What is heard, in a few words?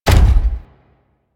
Step C; Warframe; Corpus; Jackal Redux